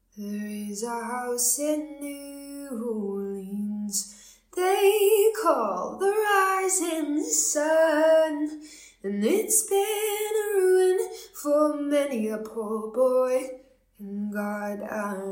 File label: singing